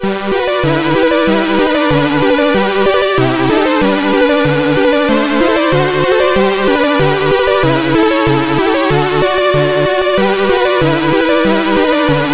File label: Jingle Bells, CSG8580R5; Schubert Michael; Jingle Bells V2